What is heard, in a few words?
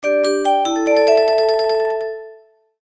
jingle; maoudamashii